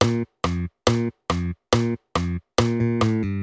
Basove figury polka zo SD do T

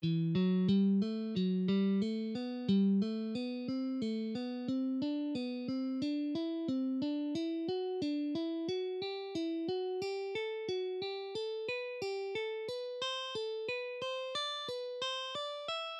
Emi rozšírené prstoklady bmp60; Cvičenie

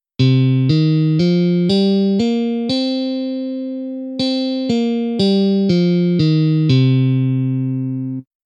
stupnice elektricka gitara bluesova pentatonika

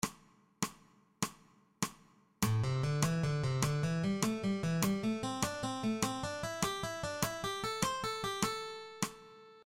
osminove trioly vzostupny motiv alternativne brnkanie; cvicenie

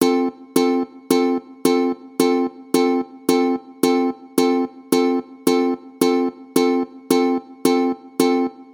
Rytmy ukulele pochod staccato

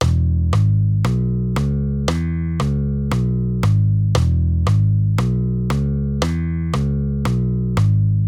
Figura tony E1 Gis1 H1 Cis E Cis H Gis

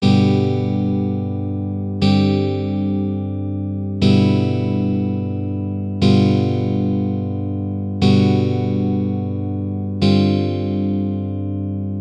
odvodenych akordov Gdur

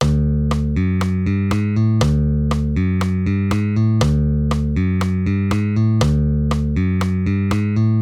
basa beat figúra

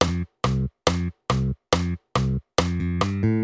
Basove figury polka s T do SD

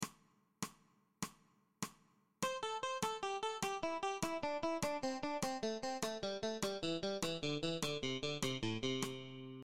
osminove trioly nadol tlmenie zapastim; cvicenie

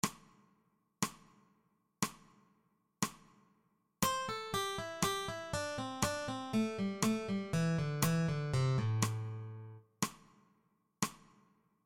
bluesova pentatonika

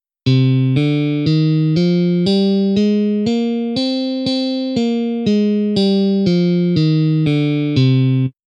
stupnice elektricka gitara aiolska

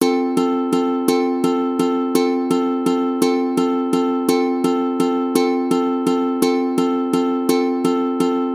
Rytmy ukulele valcik

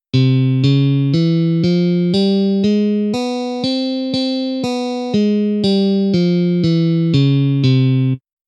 stupnice elektricka gitara 60 ciganska dur

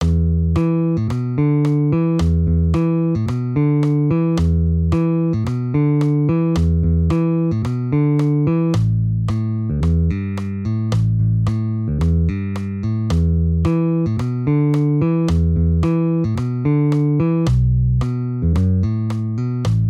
Basove figury bigbeat

